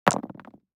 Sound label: DICE on Neoprene, Throw and Roll, Standard, 4 Four Dice; Samples; ArticulatedSounds; DICE Sfx Party (Extended)